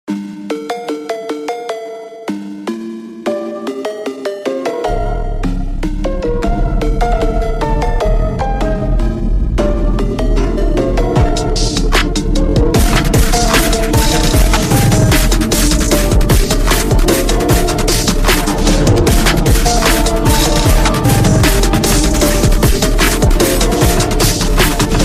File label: SLOWED, Phonks